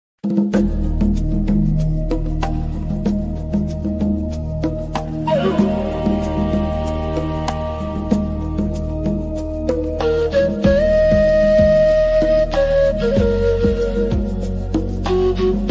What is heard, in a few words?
Native American Flute; SpiritWinds